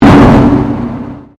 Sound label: Convolution Pulse; SoundEffects Foundation2